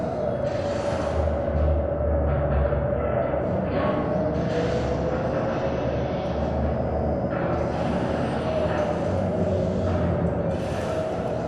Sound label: Robot Arm Track Slow Dist; Boomtown; Titanfall 2